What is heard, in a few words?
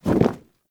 Vendor Leather Flap; Ambience; Soul Plane